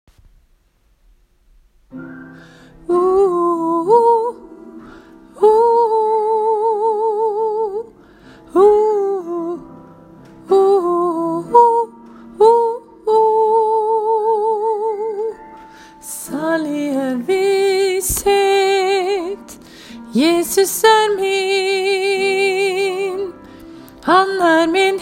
bass-tenor; salige visshet del 1; åfjord

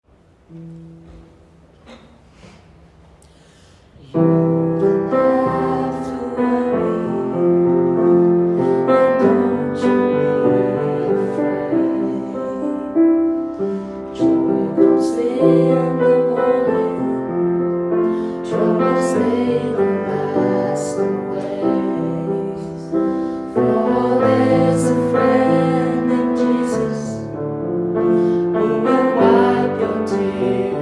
sopran, gospel; kongsberg; kor; gospel for alle

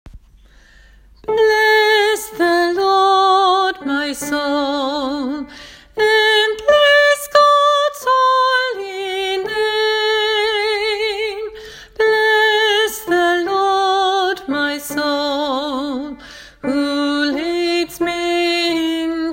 bless the lord sopran; åfjord

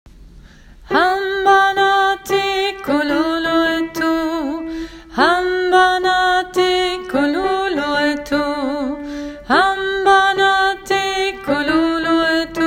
hamba nahti alt; åfjord

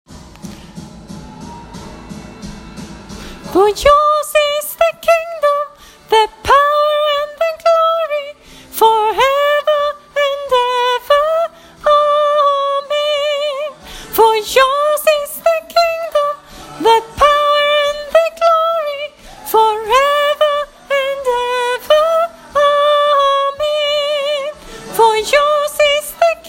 sopran bass; åfjord

